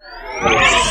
Scifi Power Down; Energy & Forcefields

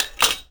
Hacksaw Metal Drop; SFX Kit; Foley - Misc

